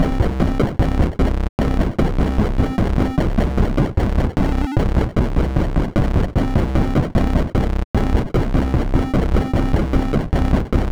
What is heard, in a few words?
mad and sad song